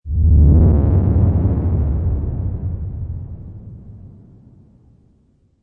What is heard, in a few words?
Evil Pass By, Dark ship or entity bypass in space or under water